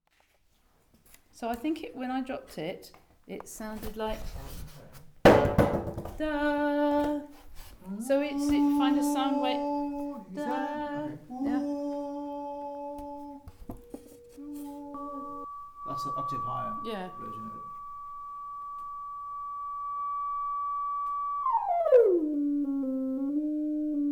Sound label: drop polyst